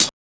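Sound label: hi hat; E-Lab; X Static Goldmine 1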